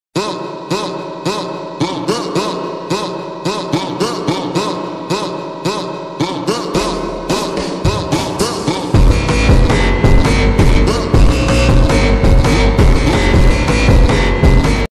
automotivo phonk ringtone attitude ringtone brazilian funk music phonk ringtone